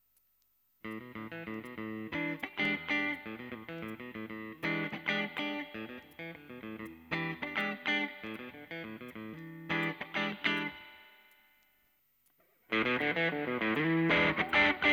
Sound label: boss sd1 808 TI chip